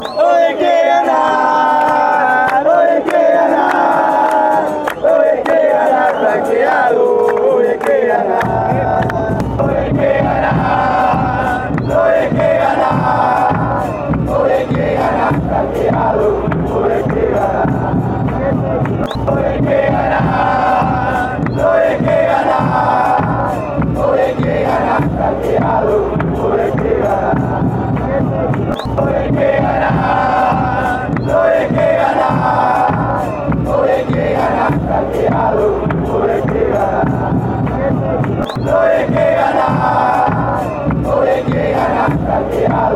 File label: club chant; club olimpia